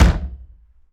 Impact Short Ring Out

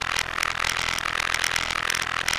Money Printing

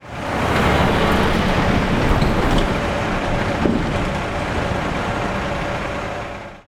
Semi Truck

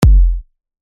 Kick Style Kick Drum Alternate

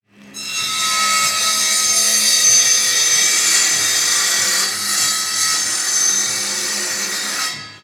Distant Wood Table Saw

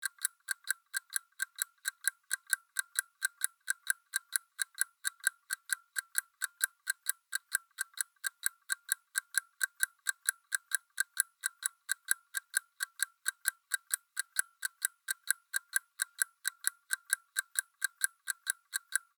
Clock tick Pomodoro Timer Ticking; Sauli

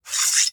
Sword Taking Out Of Sheath

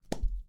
Champagne Cork

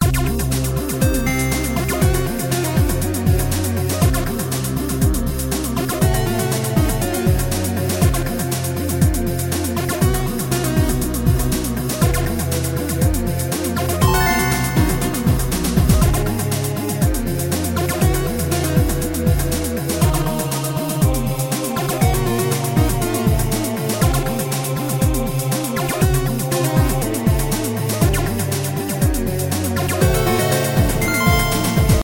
Game Loop

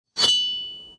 Sword Unsheathe

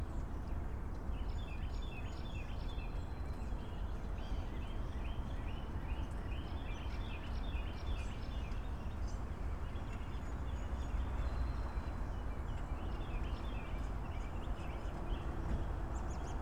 Morning Sounds

